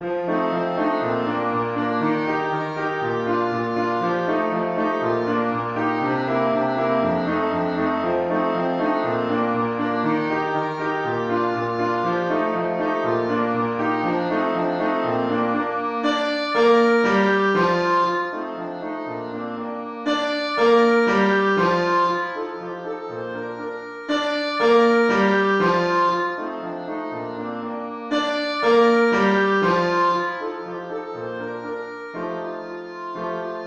clarinet

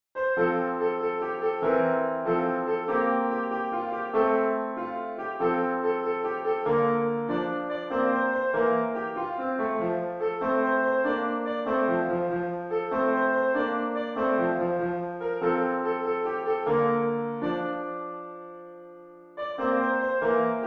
piano

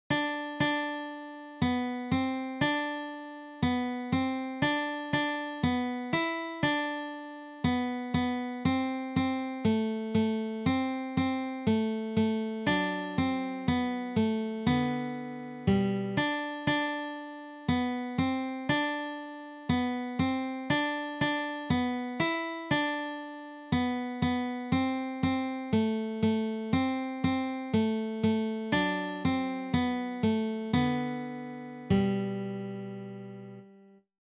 guitar

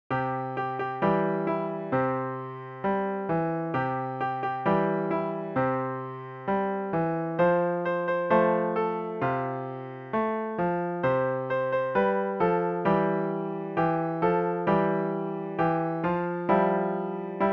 piano solo

